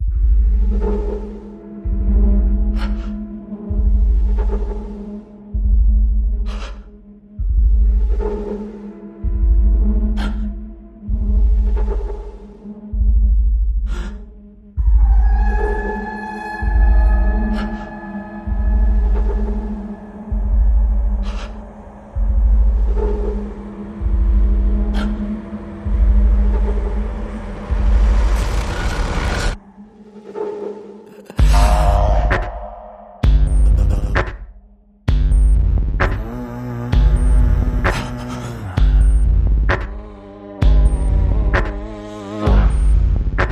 No Lead Vocals

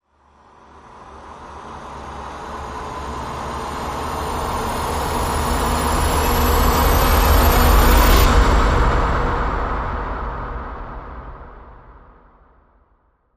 Storm Rise

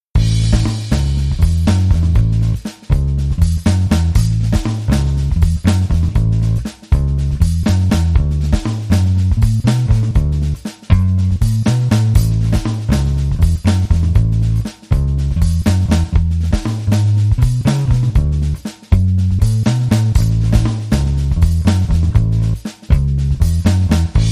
DnB

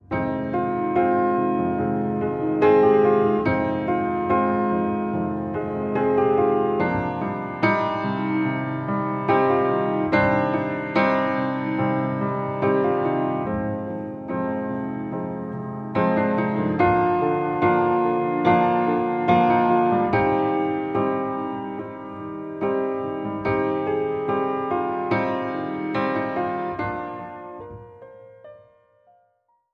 Piano Ver

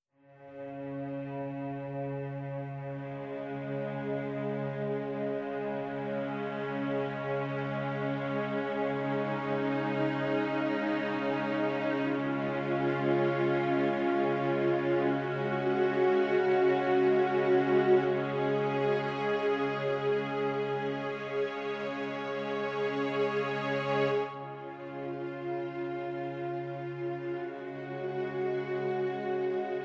Strings Only Mix

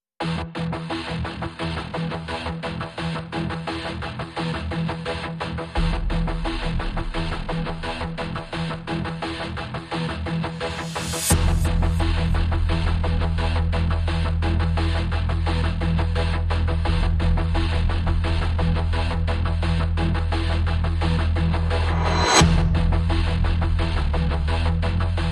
No Drum Kit No Guitars

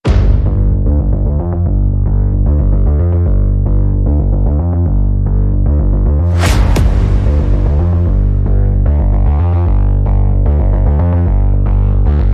Percussion Bass and Effects

